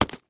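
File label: baseball sounds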